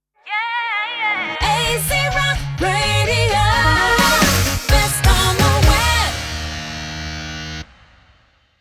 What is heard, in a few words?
Rock Radio Jingle Sting